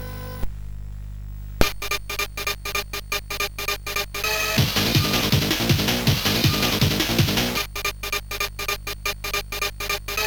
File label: sid, CSG8580R5